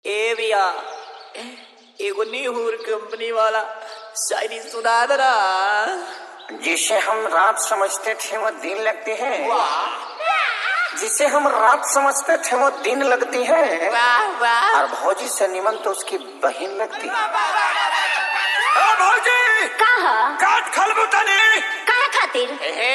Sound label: Holi Full Dhollki Bass Dance Mix